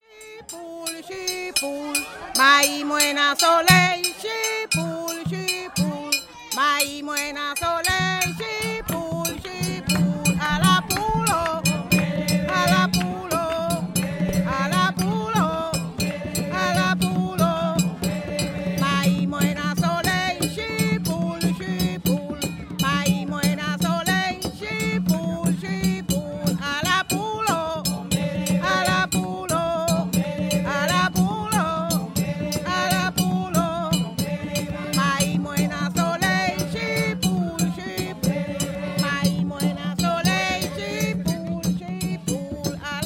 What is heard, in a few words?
merengué